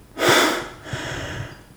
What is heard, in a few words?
male, Realistic Fatigue Voice; oblivion